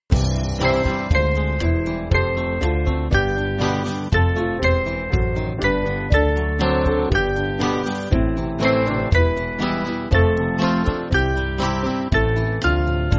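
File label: Rhythmic Band, Music Backing Files for Churches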